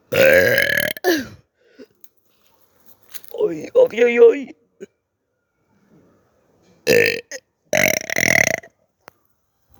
burp; polish

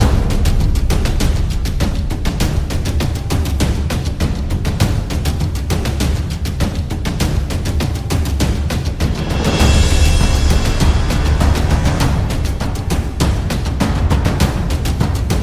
drum

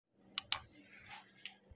clicker

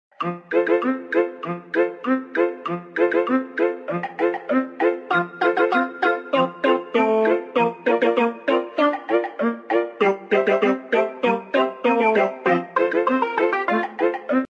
bonda music

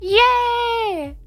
yaay

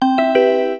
tunnelbana pling

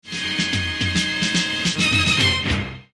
double 3d sting

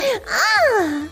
moan

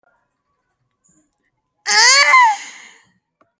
aaaaarrrrhhhhh

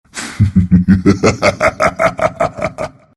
hehe

